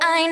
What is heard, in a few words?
vocal